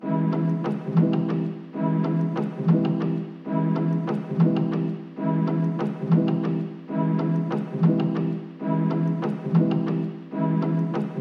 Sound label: Phonk Slowed and Reverb Remix